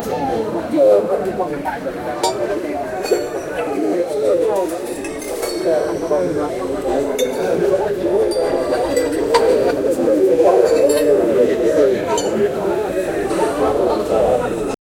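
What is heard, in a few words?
fantasy anime market crowd, bartering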